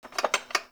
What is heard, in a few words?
Mug Clink; Warframe; The New War; Zariman